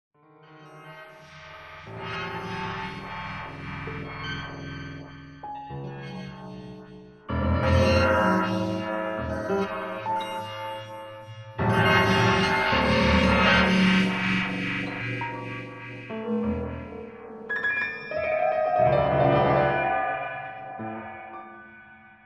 piano computer

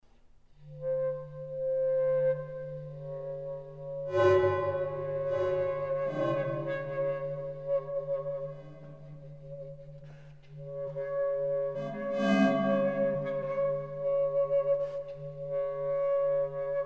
bass clarinet tape

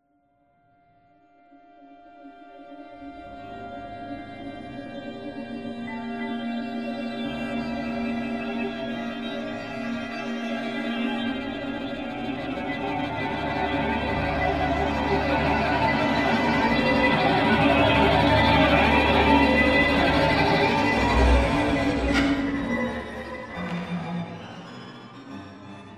sextet computer

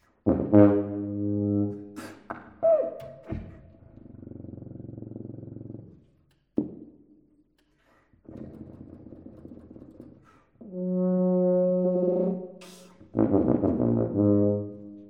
solo tuba music

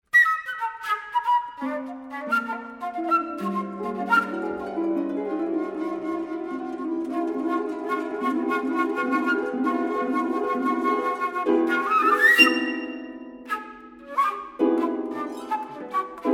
flute harp

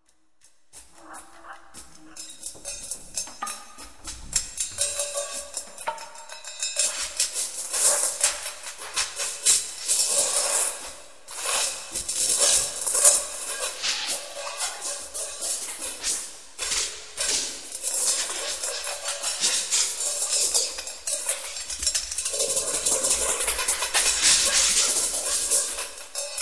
hihat computer

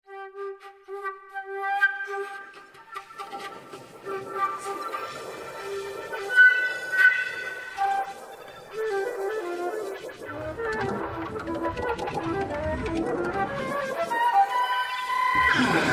flute computer

